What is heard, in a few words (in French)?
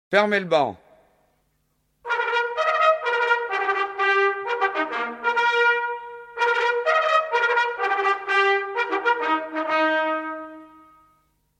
trompette de cavalerie; fermez le ban